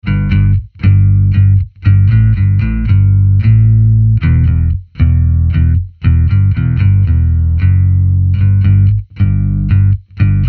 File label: Bass Guitar WET